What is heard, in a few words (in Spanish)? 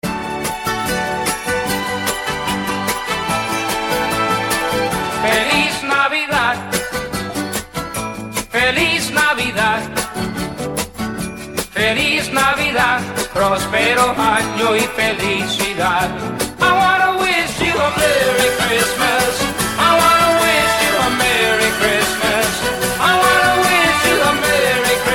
tono de llamada español clásico